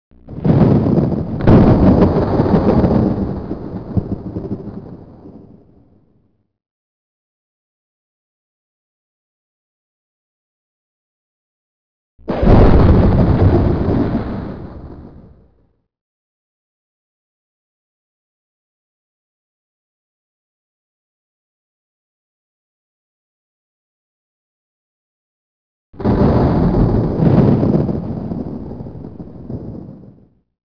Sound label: thunder